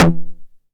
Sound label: 909 SD Tape Sat